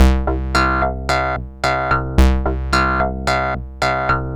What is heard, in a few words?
MODU C, Modular Synth Loops